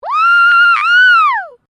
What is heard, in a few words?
FEMALE SCREAM WOO; Female vocal sounds; Screaming (Sound Effects:Voice)